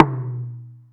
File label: TOM B1 LOU R; Zero-G; Chemical Beats